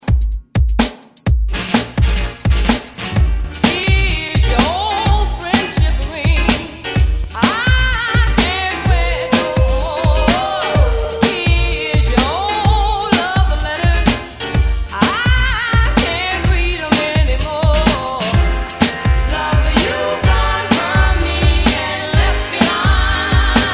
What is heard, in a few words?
ReDrum 126 Bpm